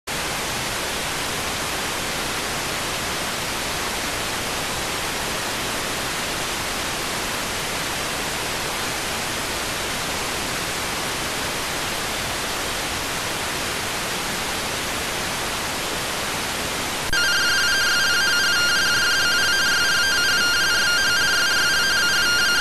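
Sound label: radioteletype receive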